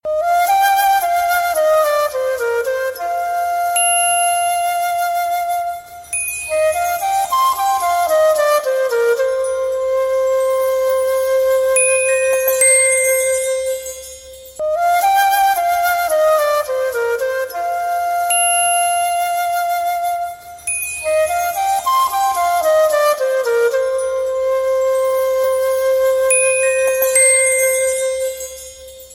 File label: Flute Bgm Ringtone